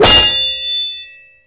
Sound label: MagicItem GhostSwords Impact